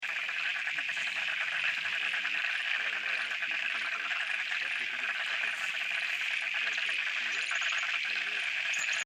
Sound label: Frogs at the tarn-pond